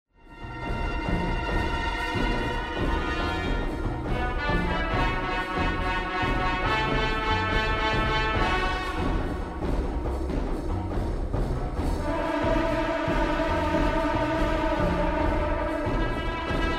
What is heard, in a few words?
bomb planted; music kits custom